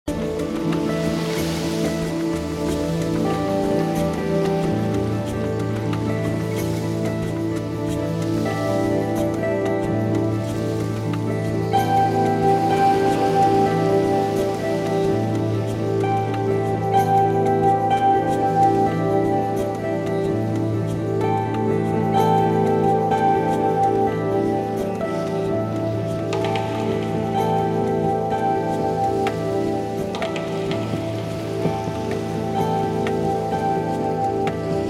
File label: Tribal Dance